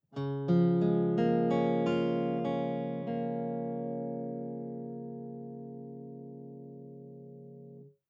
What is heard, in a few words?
open c sharp 6